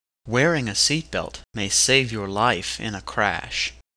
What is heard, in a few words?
seatbelt